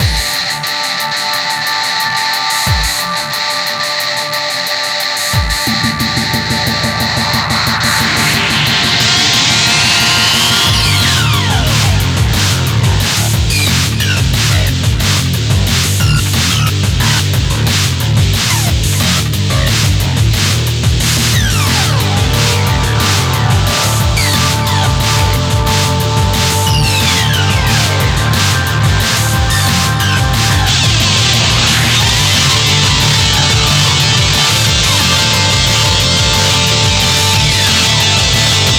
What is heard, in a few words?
8 Guitars; F-Zero GX